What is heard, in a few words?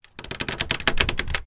Longbow Pull Back; Timelock VR